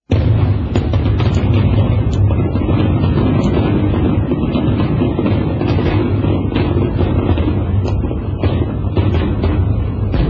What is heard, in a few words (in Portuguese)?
vinhetas Fogos de artficio